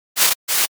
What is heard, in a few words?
paper page turn; daily life